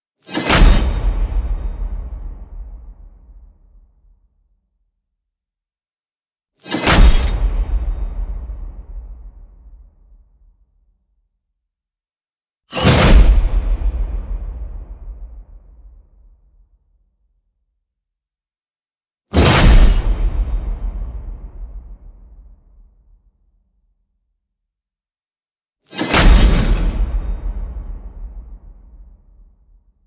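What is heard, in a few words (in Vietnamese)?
Âm thanh kinh dị cánh cổng địa ngục đóng lại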